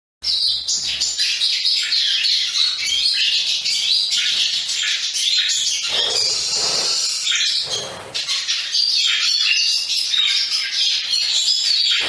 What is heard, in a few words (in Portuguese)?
Canto do pintassilgo lawrence